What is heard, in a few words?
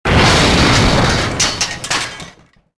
explo; Star Wars Jedi Knight II Jedi Outcast; XBOX; mark1